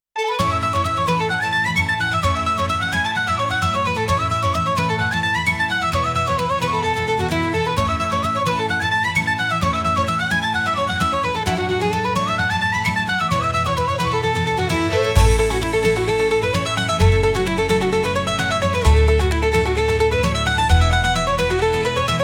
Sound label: Bright Celtic folk dance, 明るいケルト風フォークダンス。ミドルテンポ; 1分30秒編集済！明るく踊りやすいケルト風フォークダンスの新体操曲; Rhythmic Gymnastics Music Shima; 1分30秒に編集済みですぐに使える、明るいケルト風フォークダンス。ミドルテンポでリズムが取りやすく、ジュニア選手でも楽しく踊れます。草原を駆けるような爽やかで親しみやすい雰囲気の